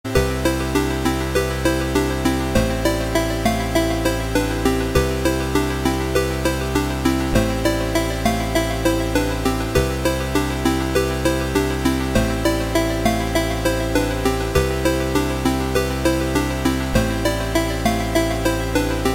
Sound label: 8-Bit